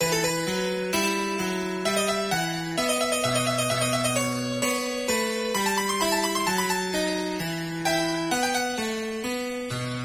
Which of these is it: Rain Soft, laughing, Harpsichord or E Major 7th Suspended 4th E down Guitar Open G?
Harpsichord